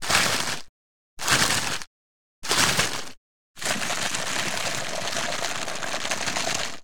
Aerorated Ice, crackle, three, pulses, gurgling, dynamic, harsh, medium; Eiravaein Works